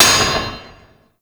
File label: Worker Hammer Impact Metal